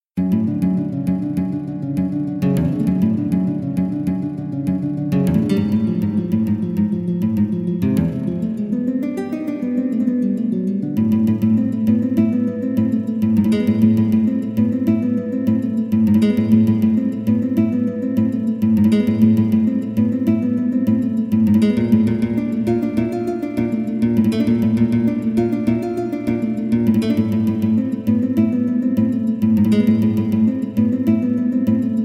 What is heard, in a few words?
zeimbekikos guitar etude